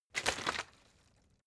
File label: bottle water sparse shake single soft; gastronomie; berufe